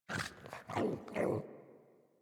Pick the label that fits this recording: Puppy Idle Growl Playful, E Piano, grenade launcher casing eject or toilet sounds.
Puppy Idle Growl Playful